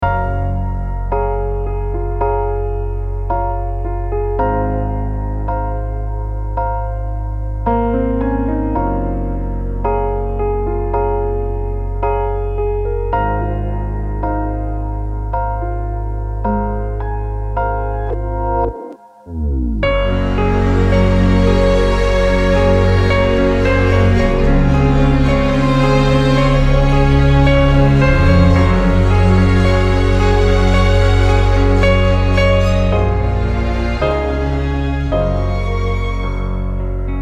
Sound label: Orchestral Ambient Hybrid